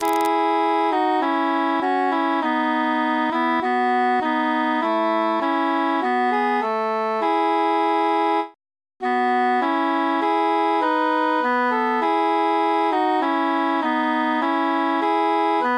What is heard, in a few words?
transposed cantus mute; we be three poore mariners